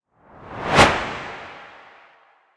Epic Whoosh; CB Sound Design

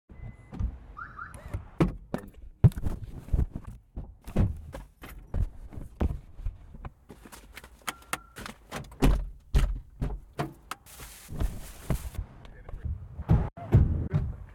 Automotive Noise Collection; 2496SoundEffects; Raw Noise Sound Effects 1

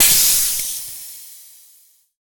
demolisher pneumatic texture; Robot; BluezoneCorp

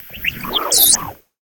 Candy, Impulse; Navi Retlav Studio; Impulse Fractalism

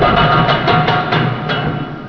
freight stop; hl2

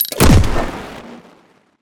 steampunk weapon gun shot; BluezoneCorp; Steampunk Weapon And Textures

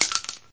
small tink concrete; weapons

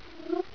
idle, headcrab poison; hl2

